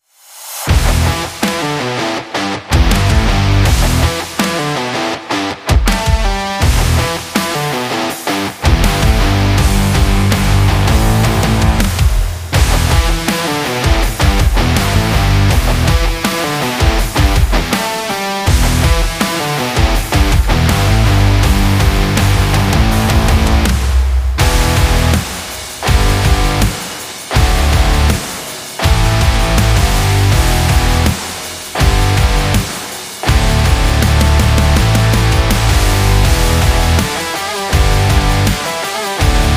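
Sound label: Tattoo, sport and action music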